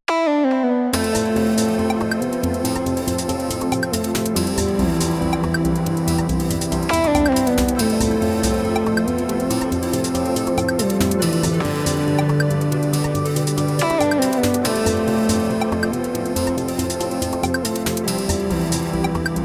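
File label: 8-bit music without copyright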